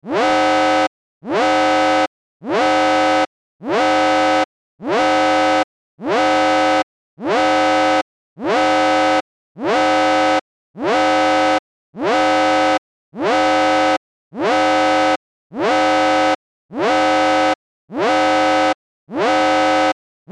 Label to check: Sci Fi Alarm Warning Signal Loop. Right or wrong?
Right